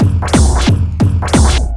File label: Beat, Drums & Percussion; Electrocution; Beats